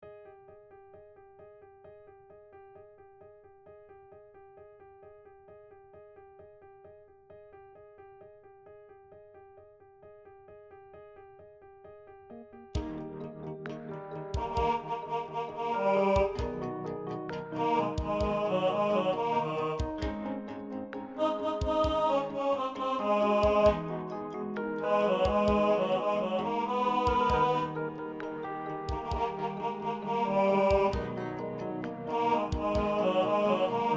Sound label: Baritone, rehearsal